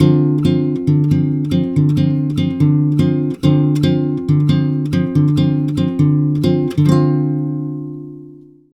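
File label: 140 guitar D minor 7, Brazil Bossa; USB Soundscan; AKAI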